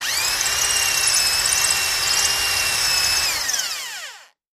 Electric drill bores holes in wood at variable speeds.; Tools, Hand Drill Motor, Drill